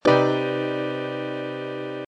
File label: Cmin M7 9